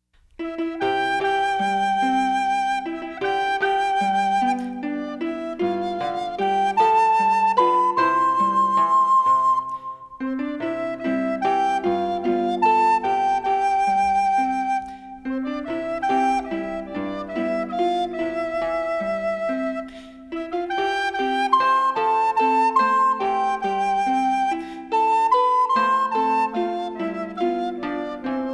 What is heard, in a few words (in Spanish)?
musica solo